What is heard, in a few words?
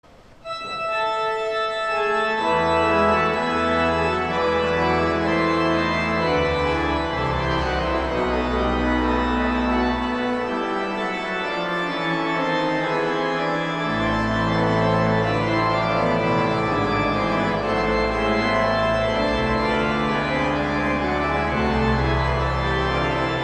Organ, Chorale Prelude; St. John's Cathedral, Jacksonville Florida; Johann Sebastian Bach; 'Christ, Who Makes Us Blessed'